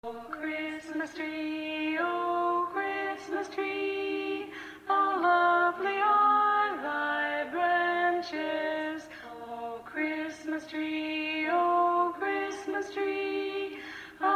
Tenor; O Christmas Tree

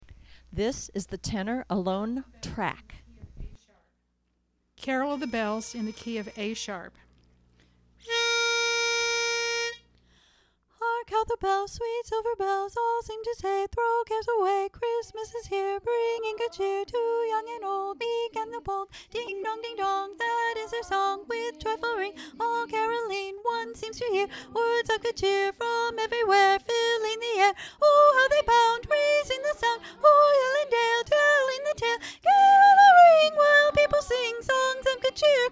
Carol of the Bells Tenor Alone

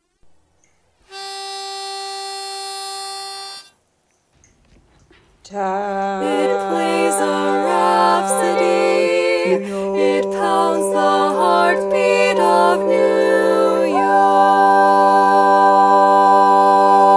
4 parts

